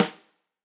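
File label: Tam Snare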